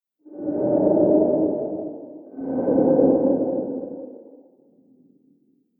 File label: Strange And Dark Wind; Ghost Sound Effects; Horror; Andrew Fleming